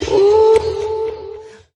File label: "woo", male